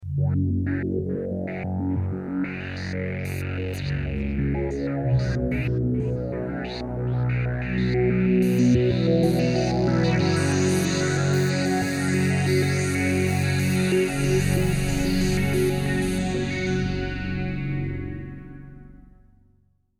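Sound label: Direful, Sequential Prophet '08, factory program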